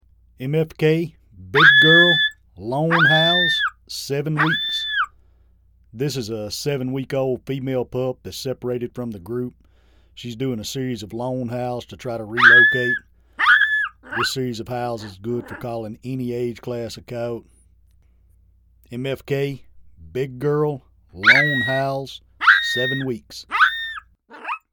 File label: BigGirl Lone Howl 7w; Game Calls